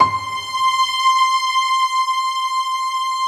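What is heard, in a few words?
Piastr, piano strings; Best Service Gigapack 1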